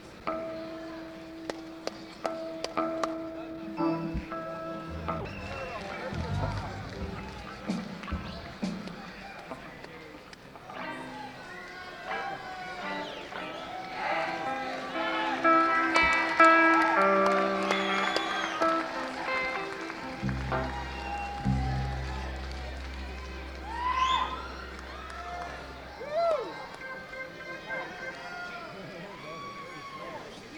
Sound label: Tuning